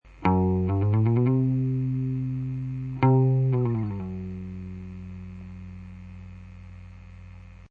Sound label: slide